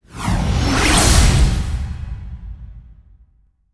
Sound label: Skill Flourish; Seer; GamigoNA